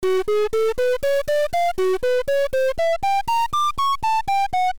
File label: tonal